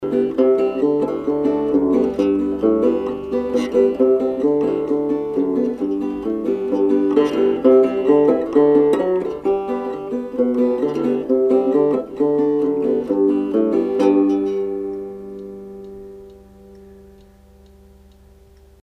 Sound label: Fingerpick Cows